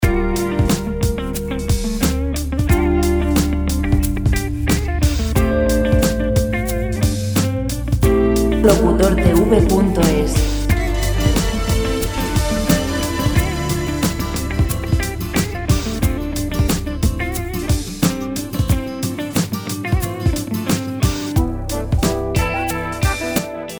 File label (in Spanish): musica libre 90 rock; Rock free music